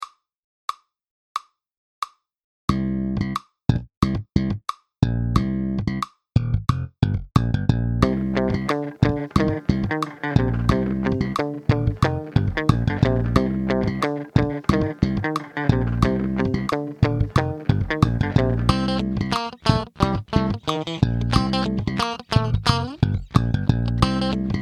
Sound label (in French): musique illusion rythmique avec click 90bpm